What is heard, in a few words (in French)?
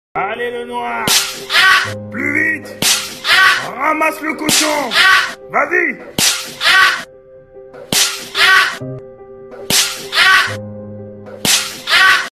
canard drop